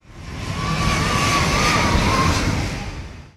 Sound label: abduction